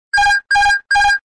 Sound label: AutoPilot Disconnect